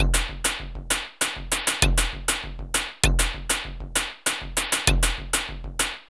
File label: Drum Loops; Big Fish Audio; Prosonus 500 Drum Loops